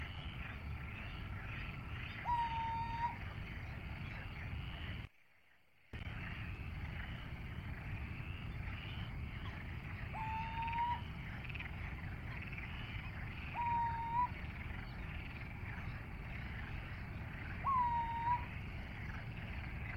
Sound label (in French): chouette cheveche